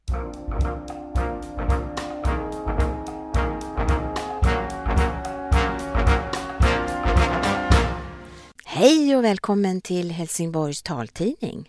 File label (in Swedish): Taltidningsjingel